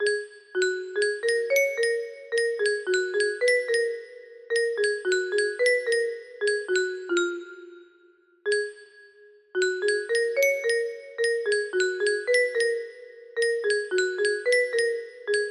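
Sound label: music box melody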